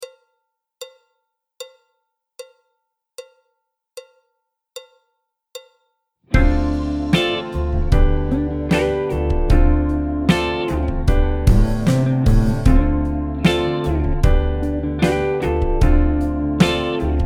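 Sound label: backing track, Jazz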